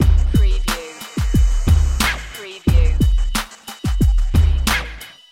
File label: Hip Hop Drum Loop 90 BPM